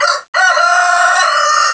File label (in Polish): kogut